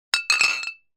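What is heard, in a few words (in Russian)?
стукаются горлышками пивных бутылок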